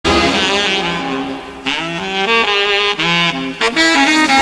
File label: solo